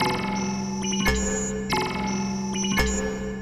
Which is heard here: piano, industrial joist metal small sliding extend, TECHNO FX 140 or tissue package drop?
TECHNO FX 140